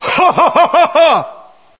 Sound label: haha